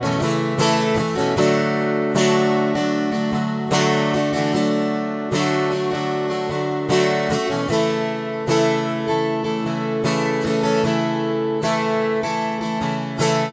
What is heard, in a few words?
strum guitar dna tuning